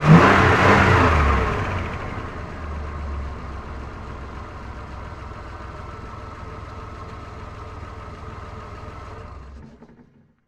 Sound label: Riot Van Idle nonloop; Prison Architect